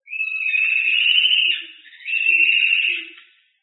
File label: whinny, owl